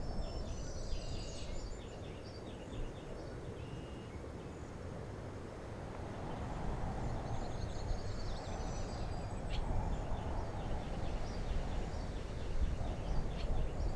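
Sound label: ambience luncheon end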